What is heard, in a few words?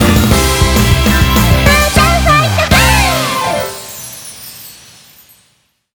BGM Reach